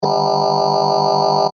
Scanner Beam Ray, Scifi; Tech; Sound Effects:Science

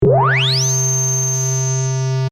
Device Power, Up, Electronic; Tech Sound Effects; Blast Wave

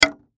Hammer Nail Hit Wood; Hammers; Tools; Industry; Blastwave

